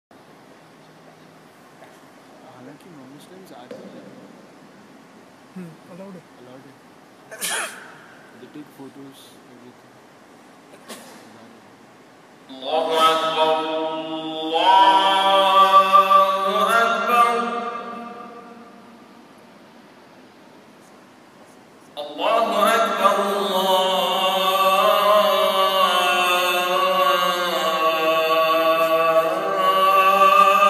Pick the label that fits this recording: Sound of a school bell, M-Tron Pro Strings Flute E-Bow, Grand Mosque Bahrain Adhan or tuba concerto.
Grand Mosque Bahrain Adhan